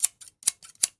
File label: Scissors; 1001 Sound Effects